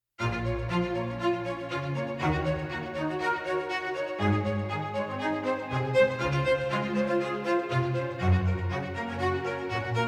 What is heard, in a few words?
Classic Violins short